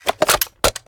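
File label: sniper reload empty mag in; gmod; iw8; alpha50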